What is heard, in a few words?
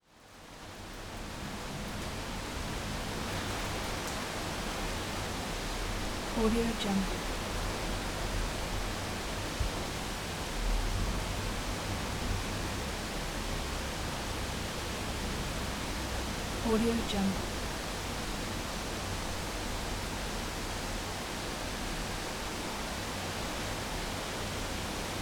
city rain from interior with open window